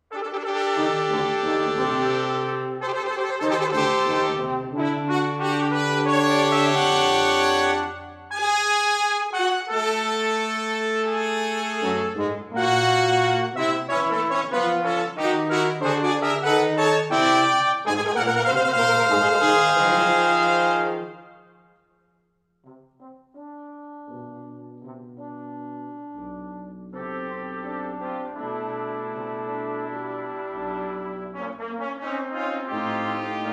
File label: Fanfare, brass; Centennial